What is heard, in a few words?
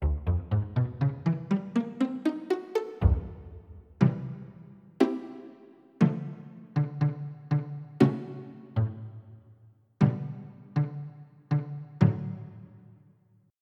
Pizz Strings; EverQuest